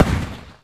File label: Percussion; Electro Flux Sound Kit